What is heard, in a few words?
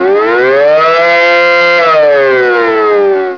alert out